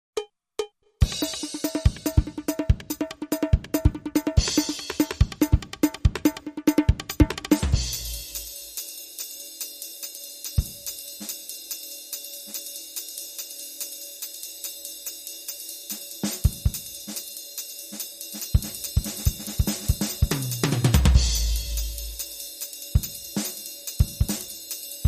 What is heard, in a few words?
Jazz Kit Loop Stereo Drums Only